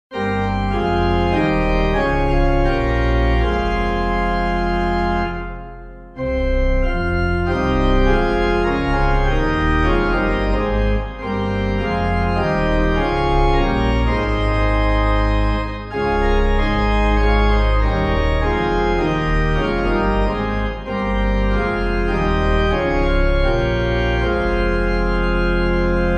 The King Shall Come When Morning Dawns, MORNING SONG, organ, hymn